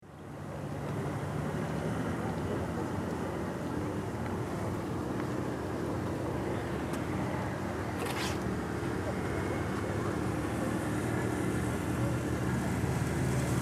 Schiffe zwei

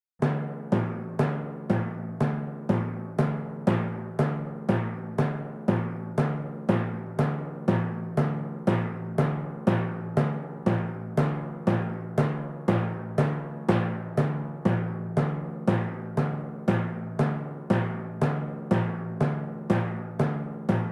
Drums Percussion Pauken Rythmus

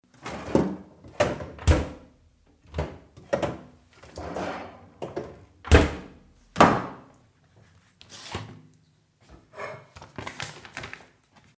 Kueche Atmosphaere

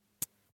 Kopfhoererstecker

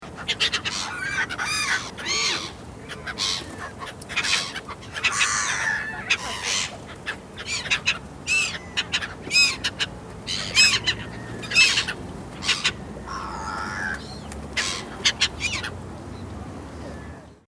Moewen und Tauben

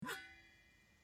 Deckel

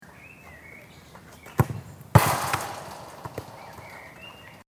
Spielplatz Anlauf

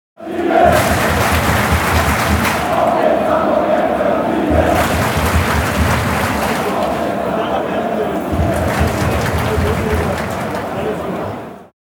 Stadion Fans und Gesaenge

